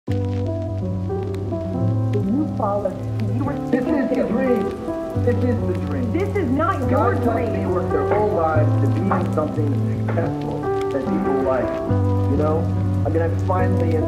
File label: Lofi Remix